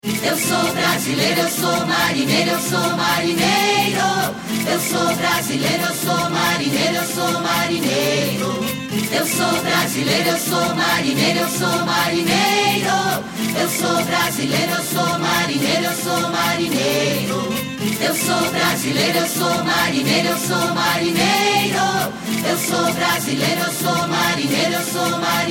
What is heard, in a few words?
Ringtone Jingle